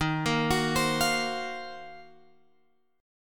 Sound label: D sharp Minor 6th Add 9th; D#m6add9 Chord {11 13 X 11 13 13} arpeggiated